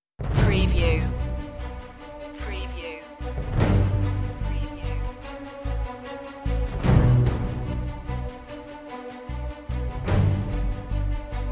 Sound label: battle call staccatos and perc only